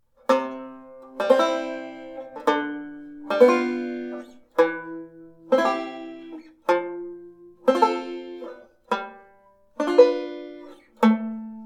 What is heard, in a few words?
Chord Scale, banjo; Beyond Chord Melody Volume II